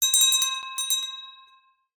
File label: carriage bell